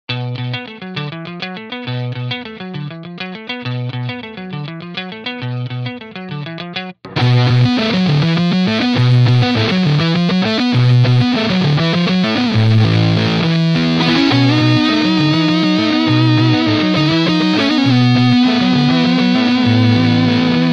Buzzy Boy LO position, pedals

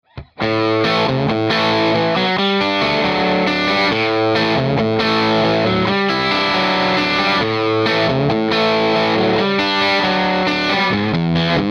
Plexi 45 - Mid Gain; mojave

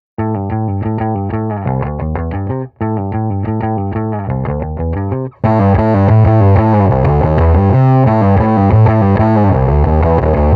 bassbully fuzz boost, pedals

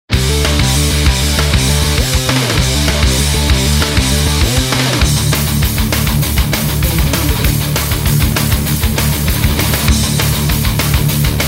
television solo

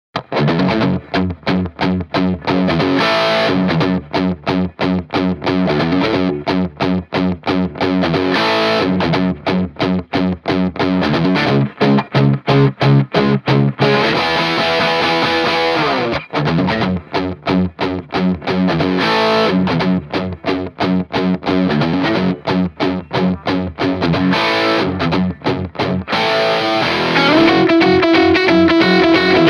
Scorpion - heavy; mojave

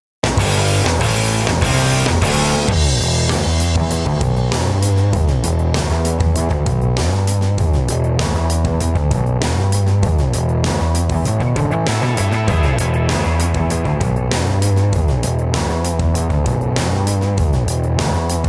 bassbully track, pedals

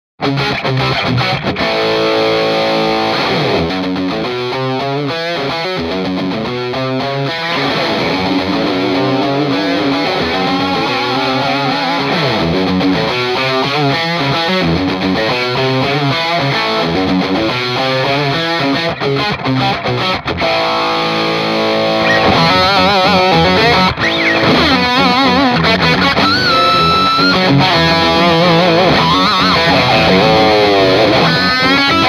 Plexi 45 w Dirty Boy BALL BUSTER pedal; mojave

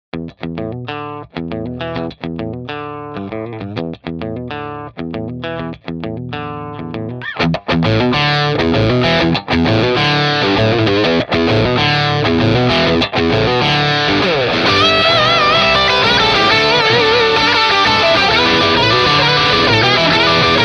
HI position, pedals; Buzzy Boy

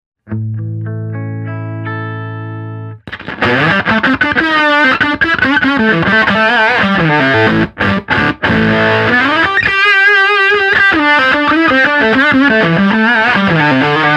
sustainer only, pedals; tone bully

